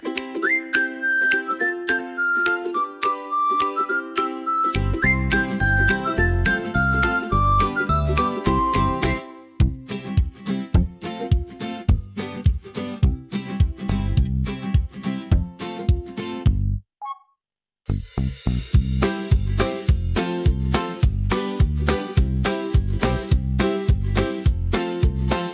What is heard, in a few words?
Instrumental; zabawa w przeczkolu